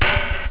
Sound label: canta, drums misc; OctaMED SoundStudio V1